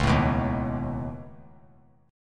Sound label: Db 7th Suspended 2nd Sharp 5th Db down, chord shape; Db7sus2#5 strummed; JGuitar